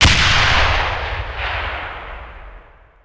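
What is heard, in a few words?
bazooka soldier fire; WAR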